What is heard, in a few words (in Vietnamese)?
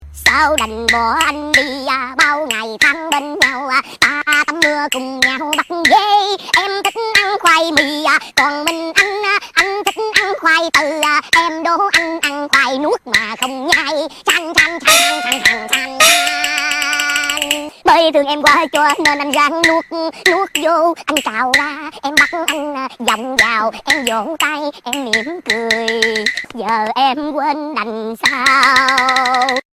Nhac chuong hai huoc